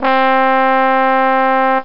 Trombone; OctaMED SoundStudio V1; jazzies samples

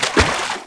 wa step, footstep water

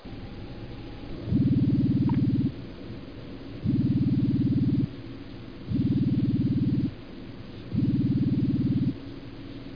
ALARM

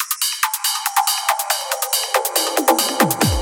tribalist; makingwaves

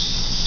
Fire Hiss; The Haunted House 1.0.2

